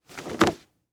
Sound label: Impact Coat Drop Leather; Impacts